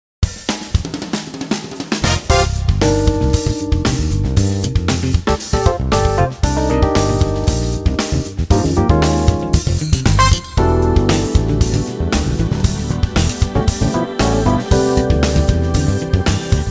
Funk Jazz; AjamSonic

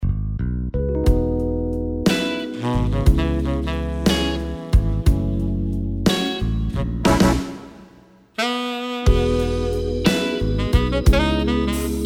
slow blues

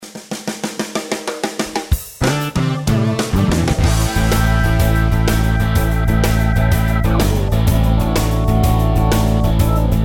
shuffle rock

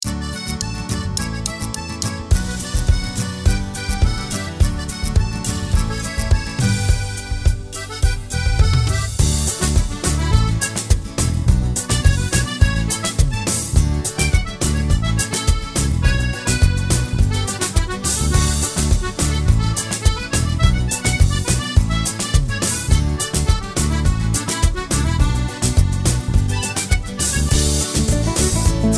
super style hot bajon 4/4; ajamsonic

